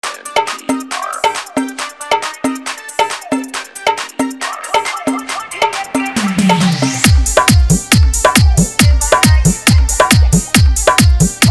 Bhojpuri Hard Dhollki Mix

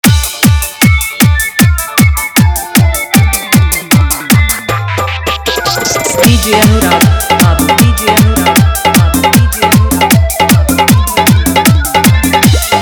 Full Dhollki Bass Rod Dance Mix

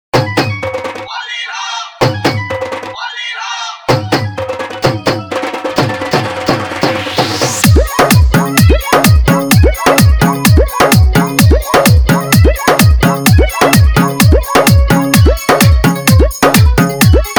Faadu Electro Bass Dance Mix

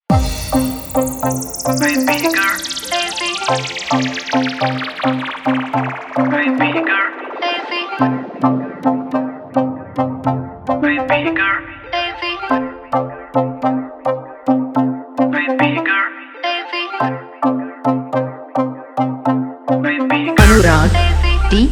Hard EDM Bass House Mix

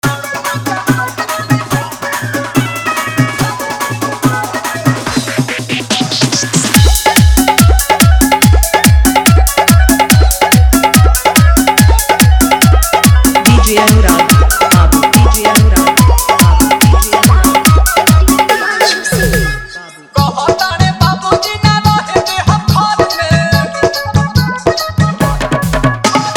Full Dhollki Bass Rod Dance Mix

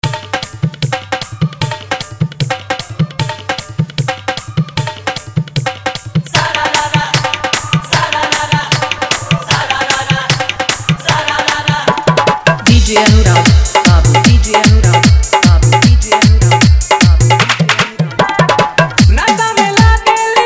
Hard Dhollki Bass Mix; Holi

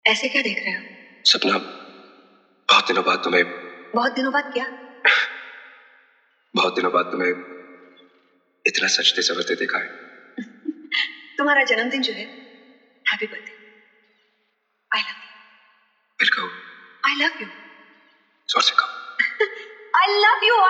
Hard Dhollki Bass Dance Remix

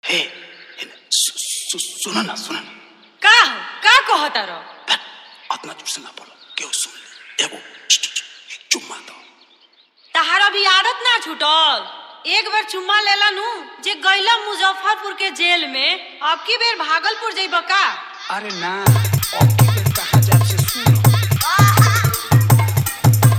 Full Dhollki Bass Mix

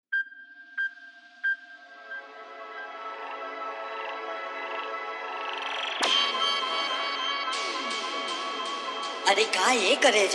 Bhojpuri Full Dhollki Bass Dance Mix

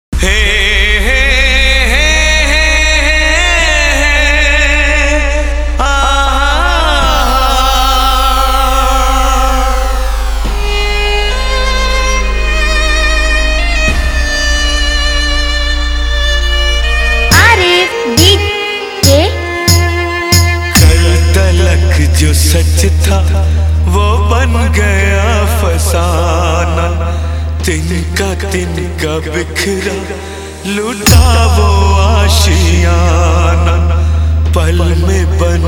Electronic Vibration Dance Mix

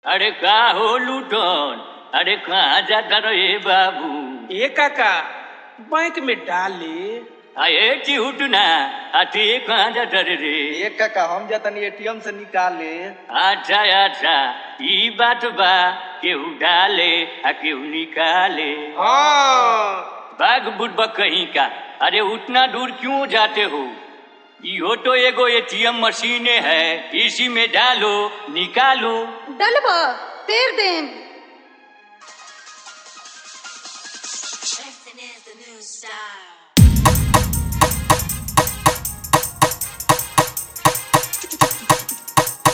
Bhojpuri Full Dhollki Bass Dance Mix

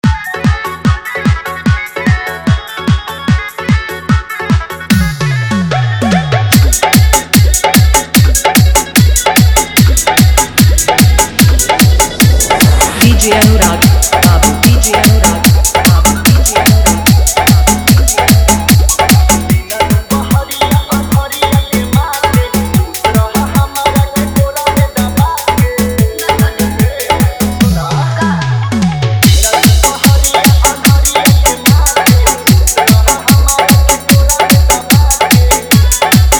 Hard Bass Dance Mix

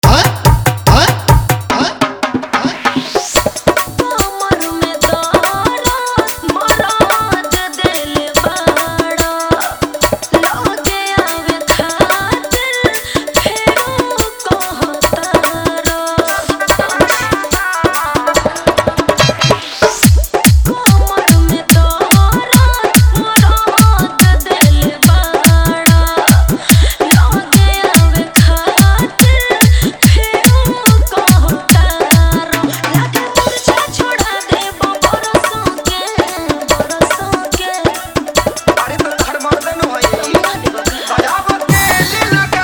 Bhojpuri Full Hard Bass Remix

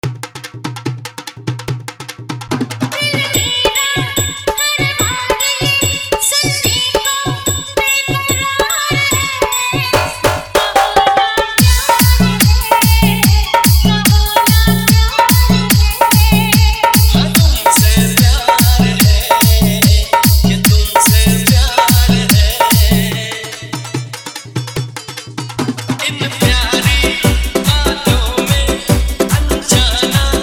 Old Is Gold Song Dhollki Mix

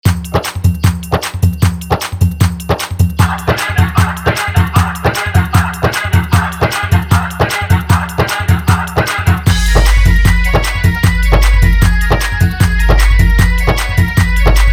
Old is Gold Hard Dhollki Mix

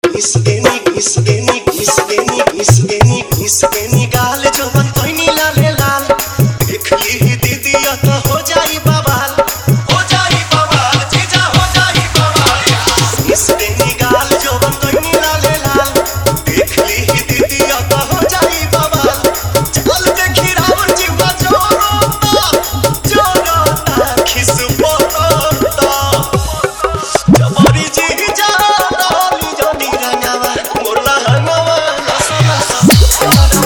Bhojpuri Holi Dj Song Faadu Bass Mix

